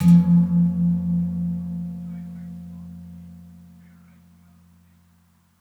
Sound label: Kempul Uppers, Gamelan